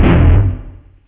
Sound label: mute, guitar single notes